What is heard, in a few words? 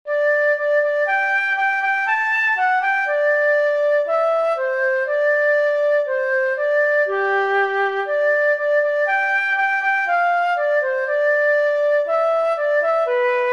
Mini Fugue, Three Flutes